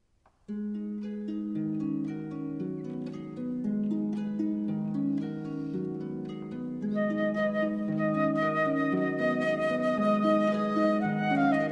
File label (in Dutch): harp fluit